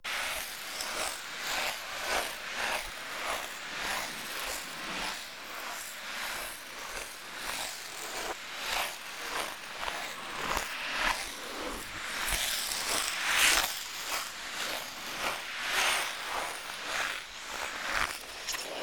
ice skating

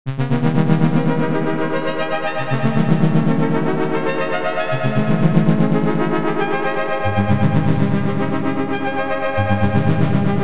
ambient intro synth sound

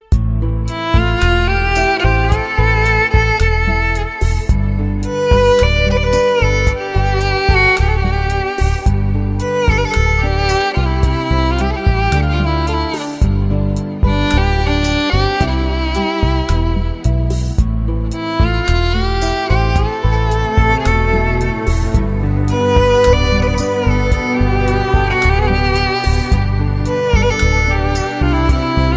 Old Hindi Ringtone

